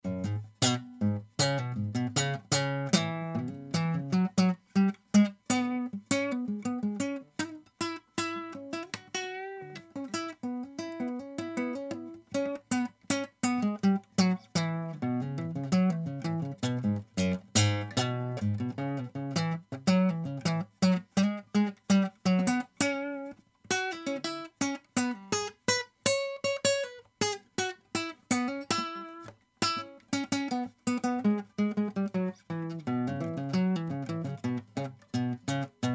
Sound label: bluesy